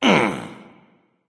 lift; chiron

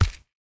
footstep; smite; ganesha

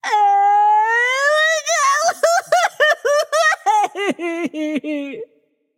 boohoo light; smite; voicepack; hel

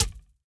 minion bow impact; smite; npc; roman archer

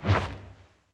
swing slow; smite; odin

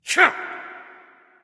grunt; smite; guan yu

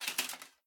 armor heavy; Smite; god Lancelot

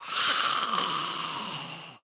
zombie run; smite; npc; roman archer

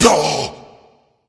surprise; cuchulainn

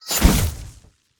gungnir charge spear throw; smite; odin

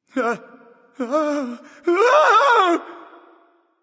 fear; smite

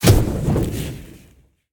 hit fire; smite; god merlin

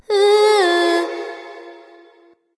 tongue light; smite; voicepack; hel

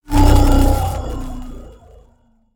projectile fear; smite; raijin

